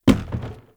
Wood Stool Impact Light; Objects

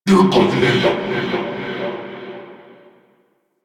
Rally Cast Vocal; Warframe; Warframes; Hoplite

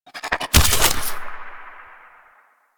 Grapple Fire; Enemies; Abilities; Warframe; Corpus; Arachnoid Hunger

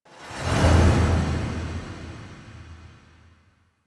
Cinematic Transition; Warframe; Quests; Entrati Labs; Free Loid Cinematic

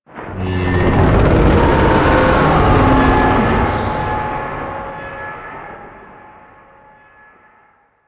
Void Dragon Vocal Distant Idle; Enemies; Vocals

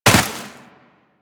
Land Snow, Footsteps; Warframe; Tenno

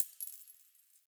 Coin Drop

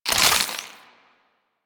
Beam Pistol Reload; Warframe; Weapons; Infested

